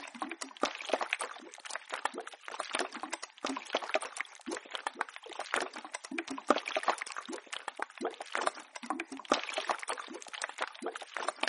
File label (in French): boucle bulle